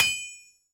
Nailsmith Anvil

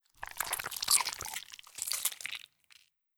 Tridentgore; Legend of Keepers (gamerip) (2019)

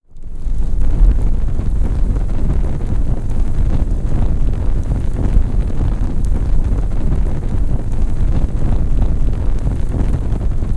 Fire Burning Long

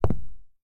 Footsteps Concrete Shoes; FX Oneshot

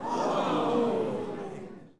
snowman crowd salah; MiniGames

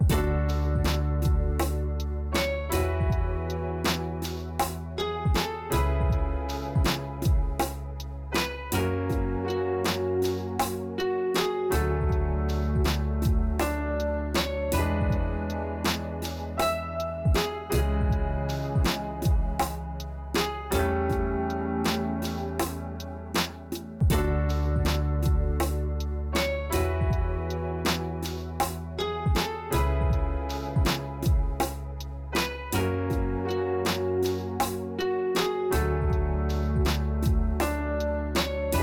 Bgm Sad; Pbff Music#48 (Bgm Str 03 Sad)

